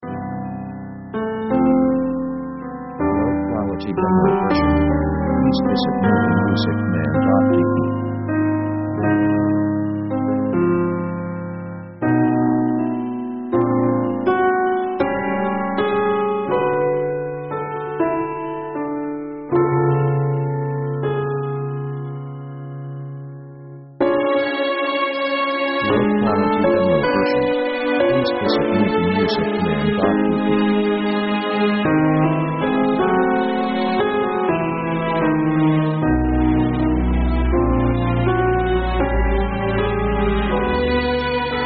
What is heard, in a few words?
jazz american dramatic; orchestra themes